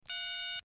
Telephone; Cell Phone Number Key Beeps From One To Nine.